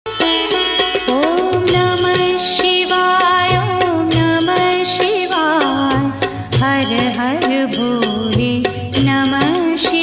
om namah shivaya ringtone bhajan; mahadev; shiv bhakti

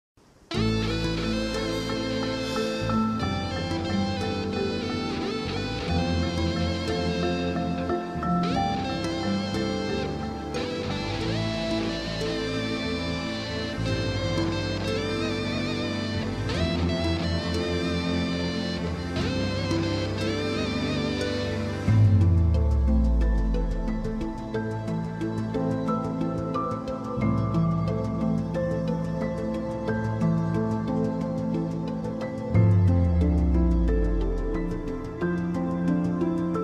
emotional bgm; Krishna and his Leela

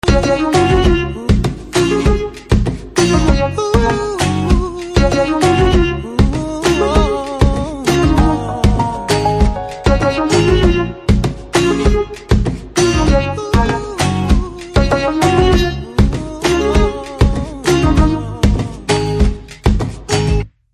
BGM Ringtone

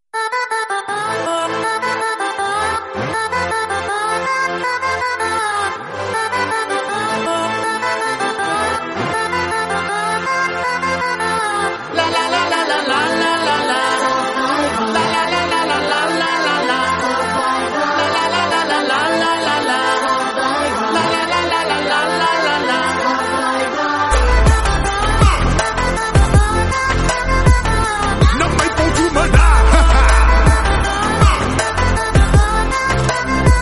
BGM Telugu Background Music; Vivegam

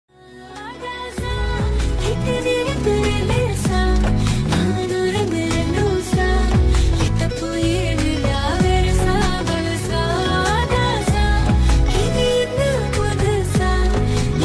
Song Ringtone Bgm Ringtone bgm; Devara Part 1

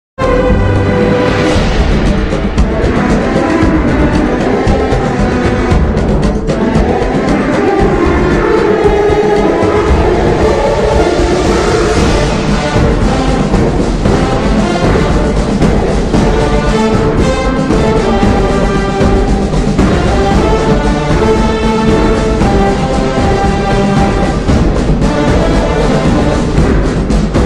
drums remix anime ringtone; one piece

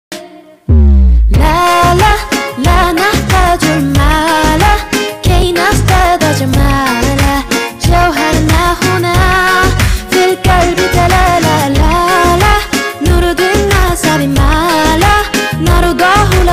female version slowed reverb ringtone

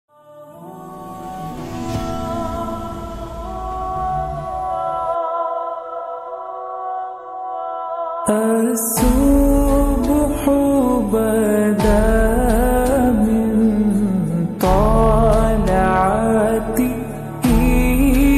Islamic naat Ramzan ringtone

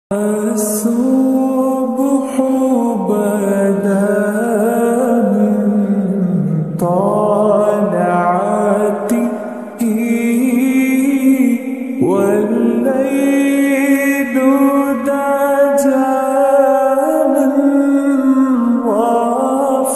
naat ringtone, islamic

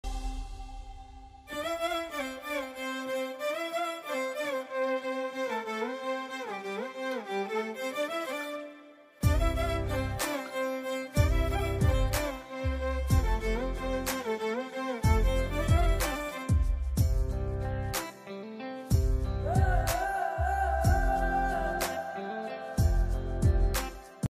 cute entry bgm; anu emmanuel

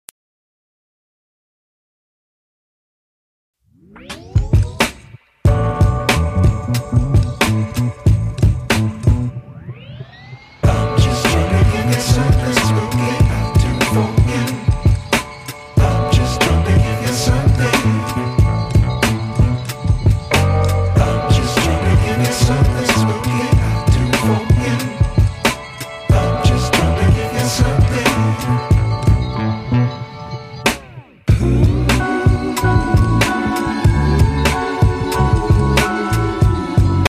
trailer bgm love bgm; Krishna Vrinda Vihari; naga shaurya

